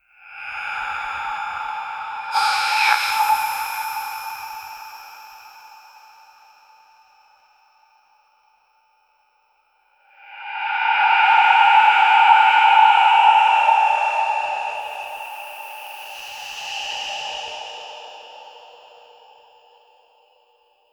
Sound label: portal opens with dark hu